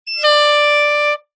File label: sport air horn 1x blast; zapsplat